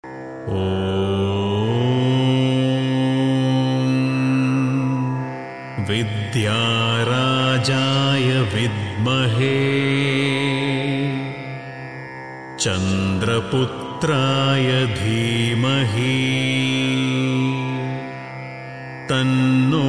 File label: buddha (mercury) gayatri